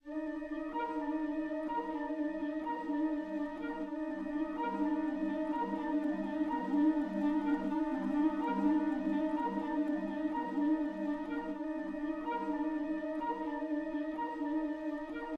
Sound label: music interactive; World of Tanks